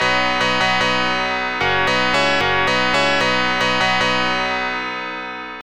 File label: Unknown Jingle; osomatsu kun hachamecha gekijou; genesis